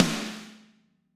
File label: Snare Crack